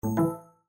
Windows Hardware Insert; abbottabad compound

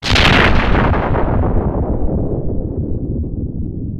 launcher explodes; abbottabad compound

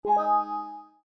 Windows Exclamation; abbottabad compound

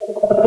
health 8Bit; abbottabad compound

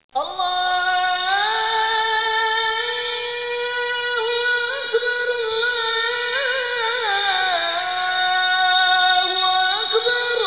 Windows XP Startup; abbottabad compound

